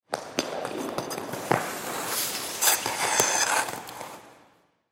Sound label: Ice Skate Slip Fall, Ice Skate Slip Fall Body Rink; Ice Skating; Sports